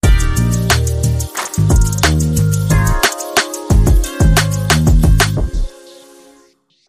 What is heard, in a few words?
Sassy Saxophone